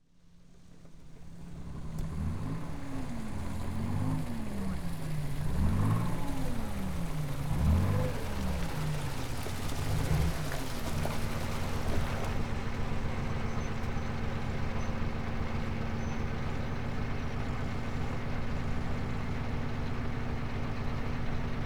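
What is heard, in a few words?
Warfare, Armoured Personnel Carrier; BBC Sound Effects Library; Explosions Guns Alarms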